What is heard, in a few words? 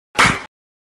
Hitting stomach